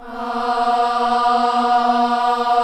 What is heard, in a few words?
KIDS CHOIR, Gospel; Spectrasonics; Vocal Planet Vol.1 Gospel; akai